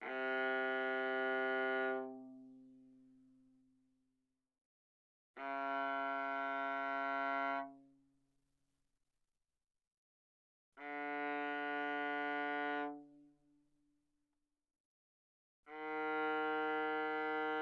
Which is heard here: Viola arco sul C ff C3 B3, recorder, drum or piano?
Viola arco sul C ff C3 B3